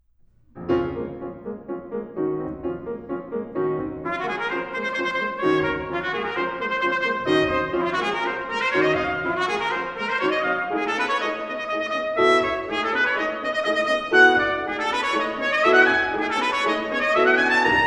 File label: Arabesque for Two Trumpets and Piano